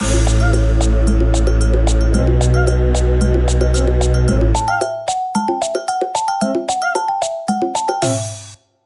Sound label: Level Selection, The Jungle